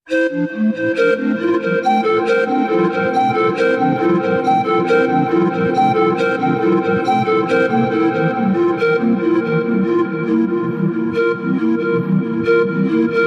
Ambient

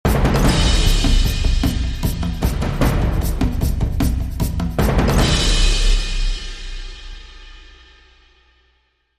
Dramatic Rhythm Builds To End, Sting

